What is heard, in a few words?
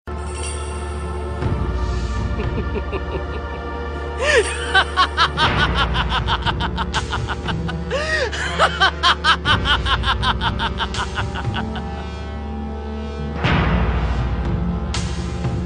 evil laugh; sasukes